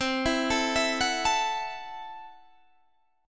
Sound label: C 6th Flat 5th, C, down, Guitar, DADGAD